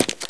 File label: hardboot generic, footsteps